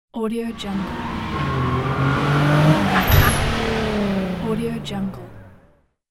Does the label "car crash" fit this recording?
Yes